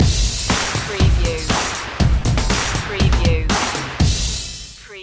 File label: clappatronic sting